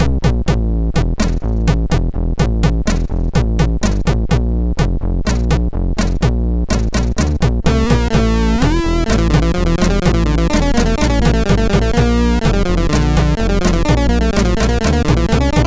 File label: Sheep, sid MOS6581R2